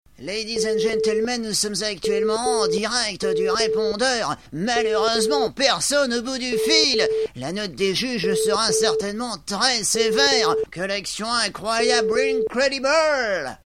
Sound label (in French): BIP